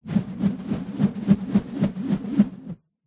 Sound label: foley rope spin swing fast whoosh